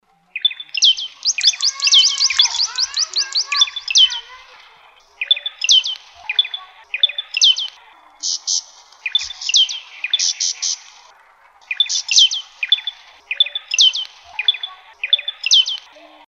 singing cisticola

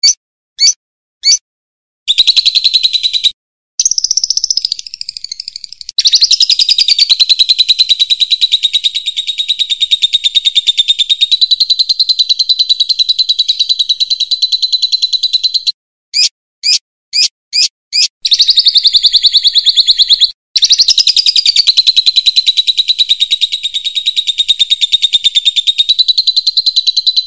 lovebird

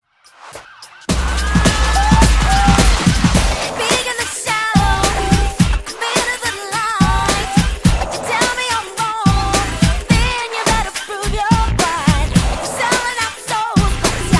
scream

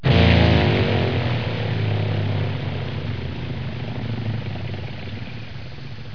aircraft

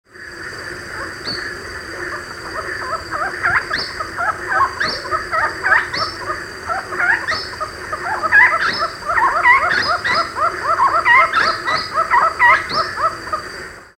ninox punctulata

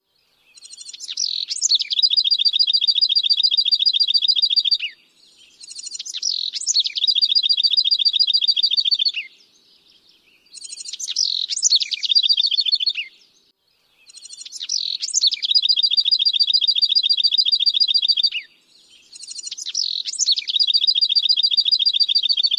housewren

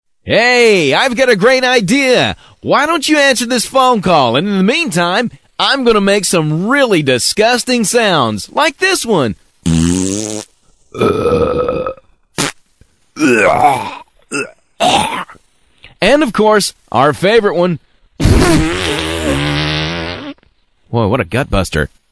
disgusting sounds

